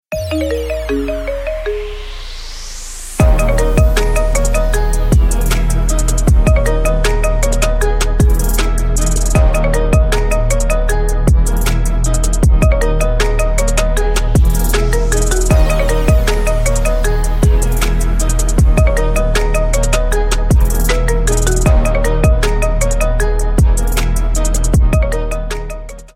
marimba iphone marimba trap remix

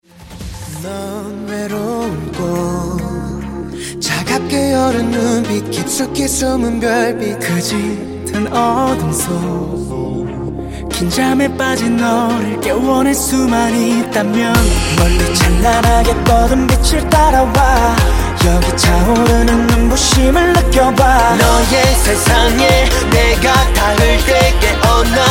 korean version

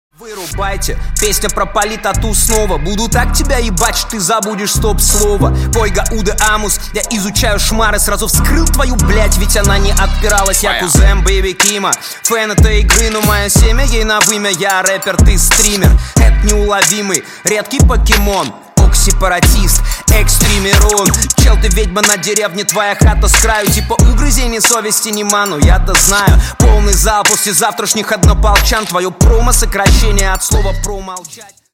bassline

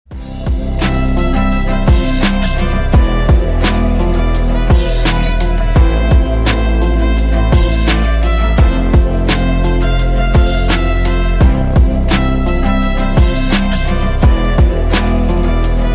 instrumental

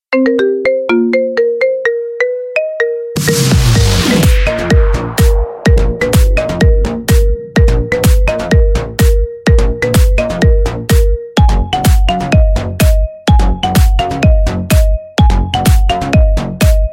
marimba marimba remix

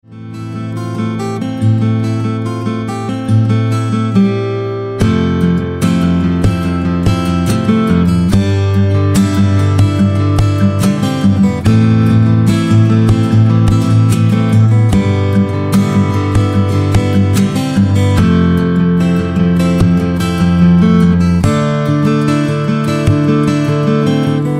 fingerstyle guitar cover

